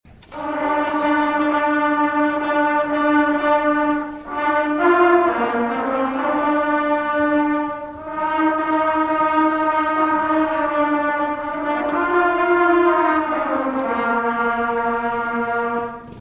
Trumpets

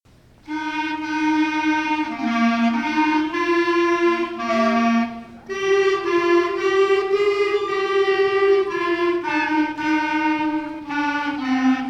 Clarinet

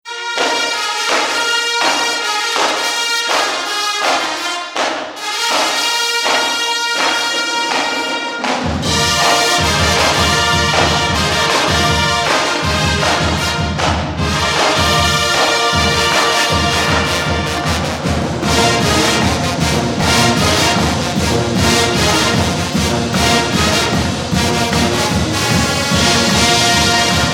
March Band

